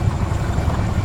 wolf loop; gdi

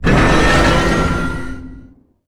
stealth tank start; nod

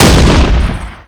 45mm m37 fire

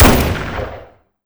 m4a1 primary fire

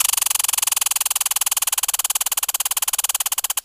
squirrel attack

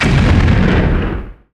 explode concrete wall

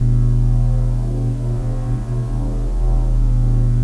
alien loop

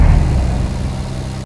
t28 stop

